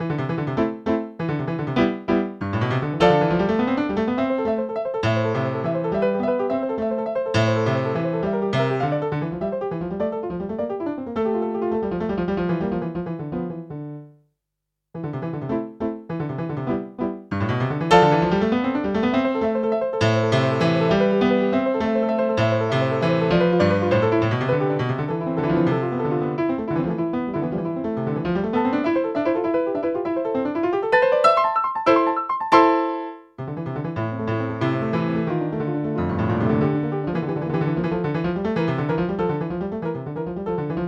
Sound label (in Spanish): Minuetto en la mineur